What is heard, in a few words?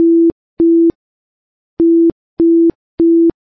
Morse Code